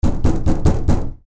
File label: door dondon; easyrpg; WitchsHeart